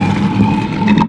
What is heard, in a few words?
Big Stone; Playable Tutorial Level